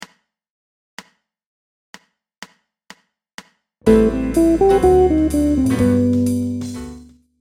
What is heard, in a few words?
Major ii V I Outlines, guitar